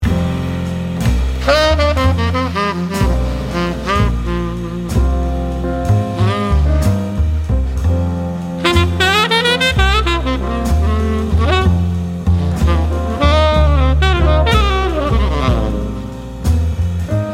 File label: Slow Down Blues sax